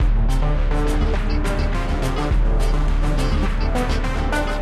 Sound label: Character Loop; android ios mobile windows gamerip 2017; gangstar new orleans